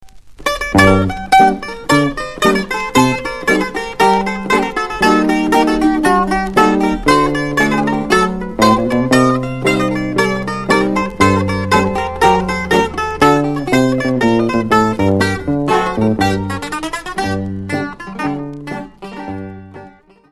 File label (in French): HASSAPIKO - Grece